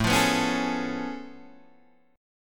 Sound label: A Augmented Major 9th A down Guitar Open D